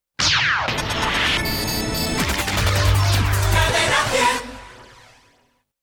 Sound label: jingle; Jingle 2 Cadena 100